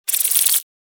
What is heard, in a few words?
Reptile tongue flicker